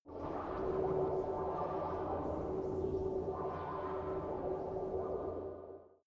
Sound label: space fabric liquid transport